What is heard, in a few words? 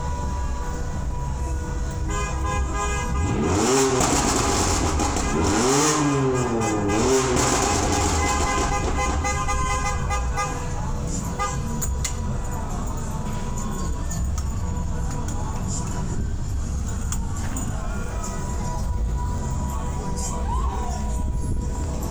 foley